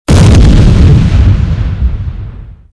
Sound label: explosion